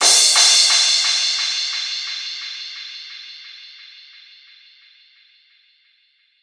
D&B Crash; neuro

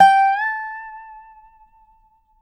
dobro, A5; DOBRO UP

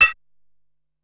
Anvil Hit, Ensoniq SQ-R Plus

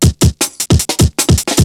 BREAKBEATS, TECHNO

